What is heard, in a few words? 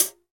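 hi-hat, hi-hat real; Sound & Vision - Gigapack I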